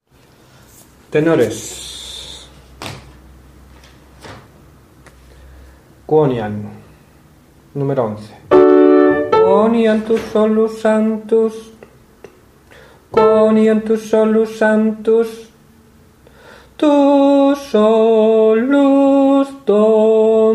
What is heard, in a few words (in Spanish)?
tenores